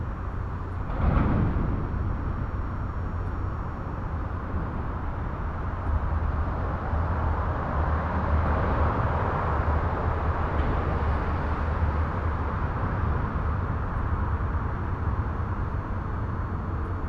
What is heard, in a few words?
Urban day activity booming; urban and suburban; boom library